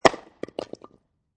Wood Log Drop On Concrete, Impact, Wood Log, Dropped On Concrete; Materials